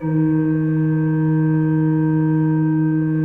D2, Cathedral Organ; ILIO; Propeller Islands Cathedral Organ

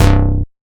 Basses Root C

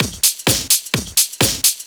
Straight Loop 128 BPM, House

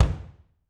BD, Drums; Bob Clearmountain Drums I

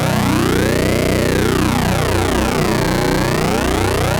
Monotron Sci-Fi

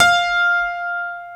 StereoGrand, Keyboards

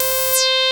FAT FUZZY, DANCE; Pro-Rec

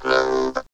VOCALS; Ueberschall; Jam Box

